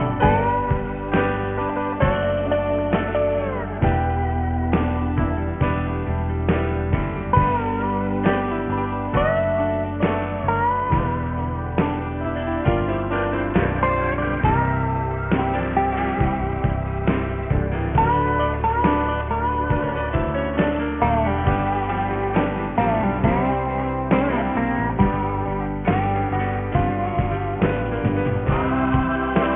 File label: dobro sample